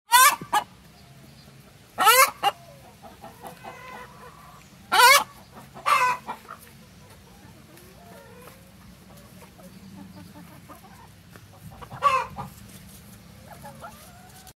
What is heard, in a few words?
chicken sound